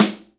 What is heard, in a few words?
DRY SNARE, Ensoniq ZR-76